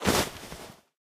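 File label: inventory items generic; STALKER; IWP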